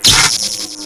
Electrocuted; New Super Mario Bros. Wii

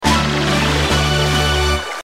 character join; Paper Mario 2 The Thousand Year Door

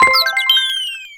Para Beetle 1up; New Super Mario Bros Wii

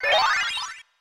Shield Block; Ness; Super Smash Bros

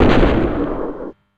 Jump Flip Hit; C Falcon; Super Smash Bros

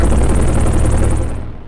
Doom Ship Take Off; New Super Mario Bros. Wii

